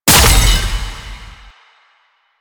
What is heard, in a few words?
Light Orb Hit; Warframe; Eximus; JadeLight